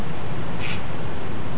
Purple Heron

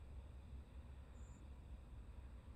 eyebrowed thrush, nocturnal flight calls

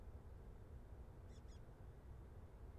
thrush dusky naumanns; night flight calls in beijing

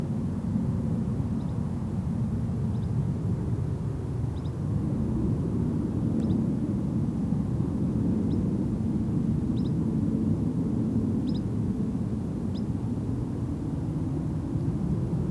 Pied Kingfisher flying; ChaoBaiHe; beijing

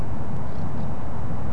Northern Shoveler

